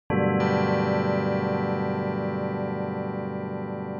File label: surprising bright chord